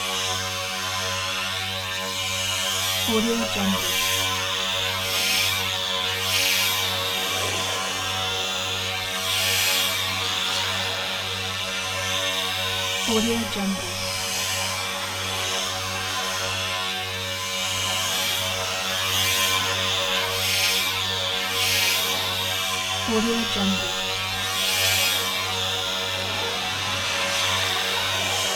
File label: missile constant rizzy buzzy synth; prosoundfx